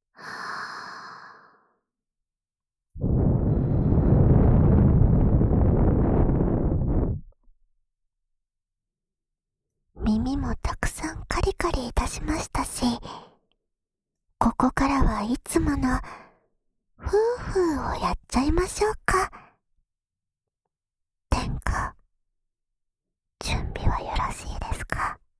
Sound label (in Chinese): 吹耳朵（左）; 后日谈